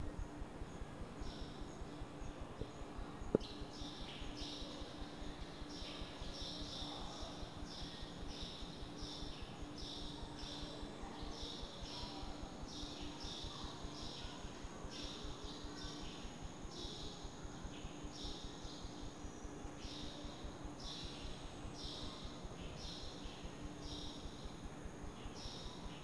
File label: bird; soundscape